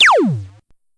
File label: Laser; SSV-Music